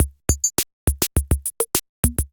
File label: C78 Substance Kit groove 103 bpm, Drum Essentials; MIDI Clips; Ableton